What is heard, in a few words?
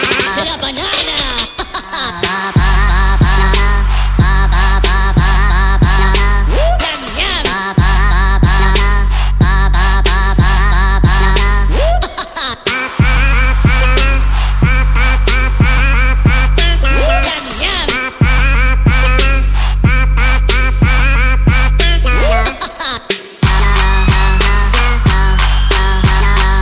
Banana Trap Remix